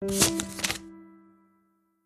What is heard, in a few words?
wood tile flip